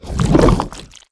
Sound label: devour swallow; balathron; svencoop